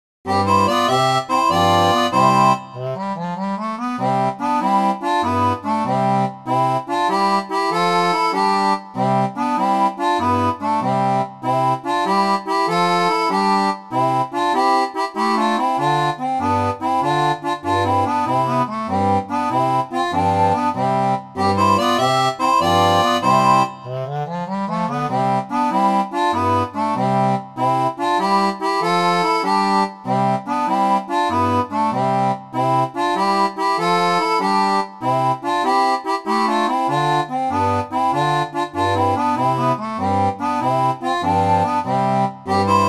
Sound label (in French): seraphone, orgue barbarie